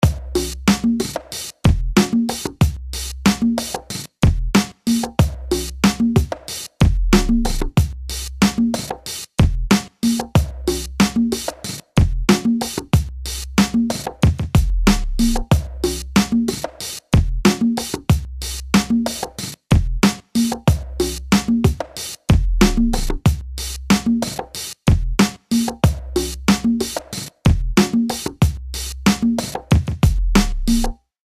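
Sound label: orchestral 93 bpm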